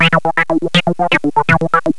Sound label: phrase phase, techno riffs; Terra Sound Library